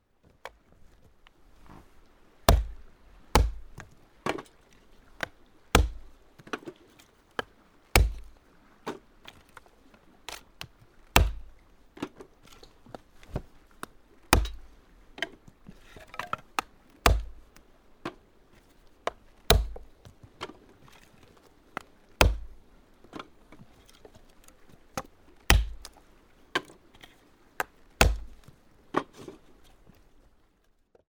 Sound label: Chop Kindling